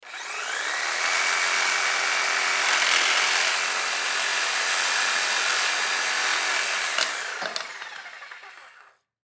Jigsaw Rigid Six Amp Turn On Cut Pvc Pipe Turn Off; Saws; Industry